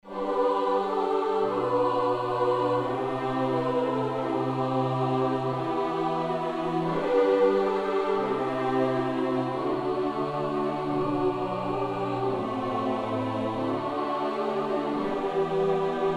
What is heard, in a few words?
Chorale; one thousand lies